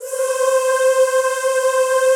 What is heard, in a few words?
ZZZ C4, Classical Choir; Best Service; Extended Classical Choir